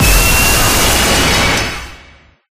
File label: Battle; Life of a Naomi